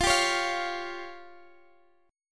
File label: F Suspended 2nd Sharp 5th F down Guitar Half Step Down